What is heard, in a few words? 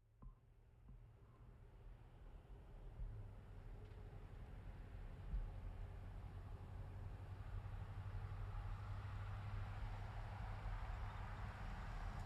Truck GMC Savana By Corner Slow; 2010 GMC Savana; Trucks; Vehicles